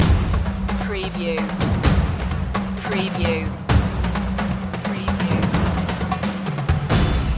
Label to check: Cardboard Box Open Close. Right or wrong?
Wrong